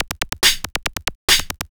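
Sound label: DRUMLOOP ELECTRO 140; Techno2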